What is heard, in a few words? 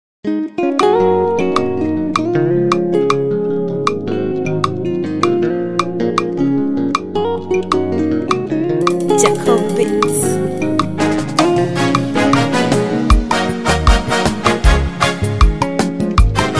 Omubiri, Choir; Streams of Life Choir Kennedy Sec School